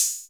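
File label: analog open hat; 90 sSampleCDs; Crypton - DrumBase5000; ANALOG KITS1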